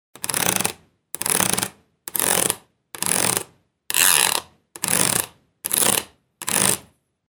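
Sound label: plastic sound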